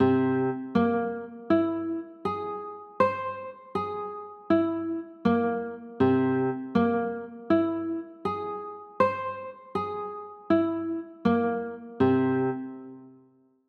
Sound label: c sharp 6 9, chords